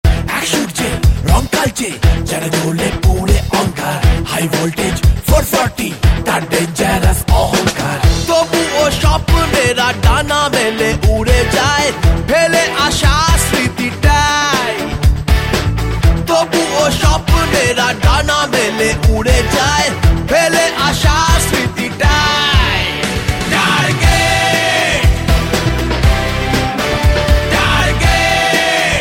title song ringtone; TARGET

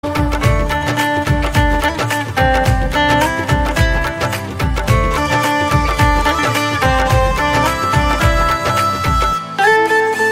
INSTRUMENTAL RINGTONE

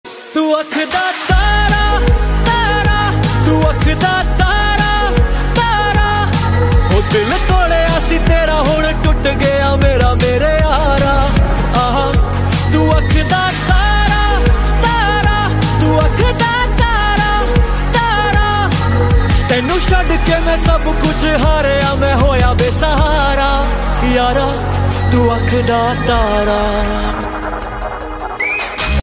PUNJABI RINGTONE

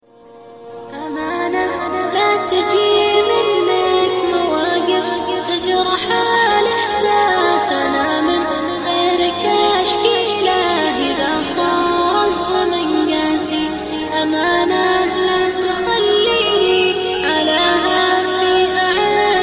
Islamic very nice ringtone

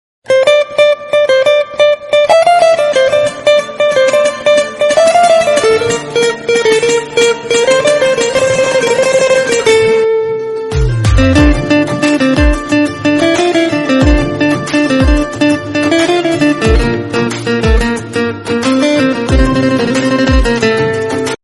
RINGTONE INSTRUMENTAL

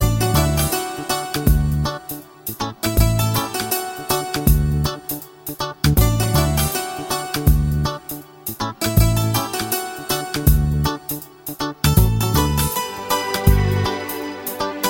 INSTRUMENTAL RINGTONE

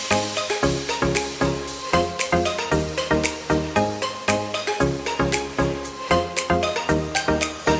VIVO RINGTONE; IQOO Z6X

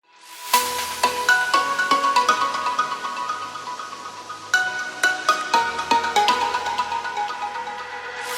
vivo x80 lite notification ringtone

